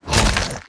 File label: Kraken Attack